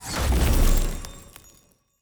witch Expand; netent

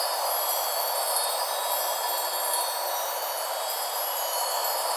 reel Spin; netent

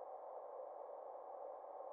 sparks reel spin; netent

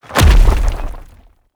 colossal Landed

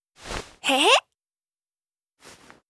green win arms; netent

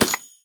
News Paper Outro Win Attention

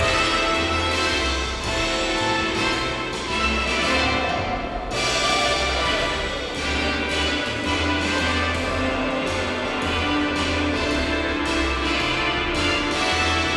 map loop; netent

